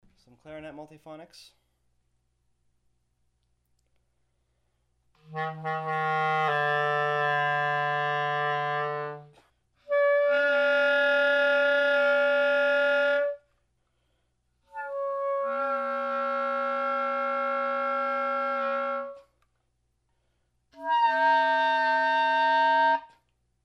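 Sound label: clarinet multiphonics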